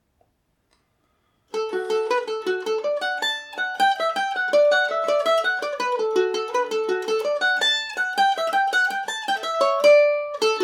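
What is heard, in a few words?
D major, irish mandolin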